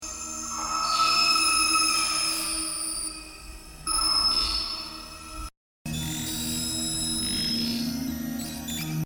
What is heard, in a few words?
Chant